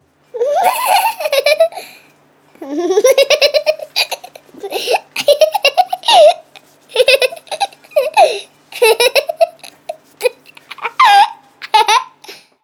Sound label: Laughing Baby